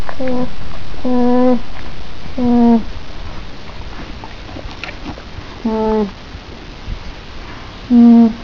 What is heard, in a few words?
hum, alpaca